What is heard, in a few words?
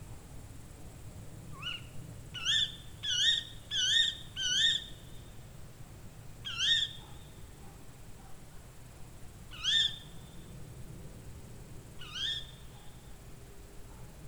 Tawny Owl